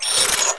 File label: SLIDER; mac